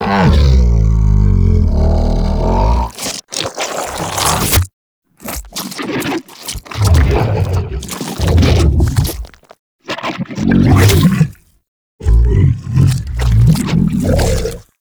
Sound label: Helminth Kiss Pet; Liset Alchemy Lab